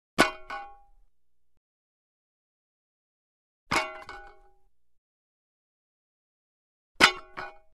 Axe Falls With Ring Out Axe Drop & Bounce With Light; Hollywood Edge Foley Sound Library